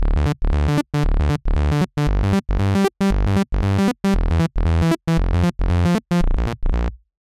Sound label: bass